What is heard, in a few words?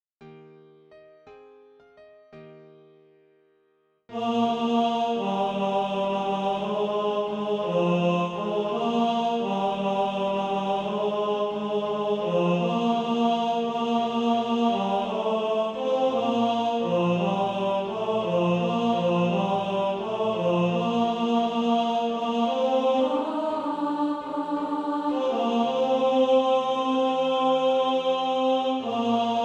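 tenor, Practise Music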